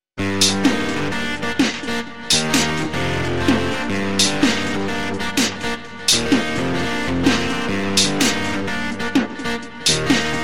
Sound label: ROCKABILLY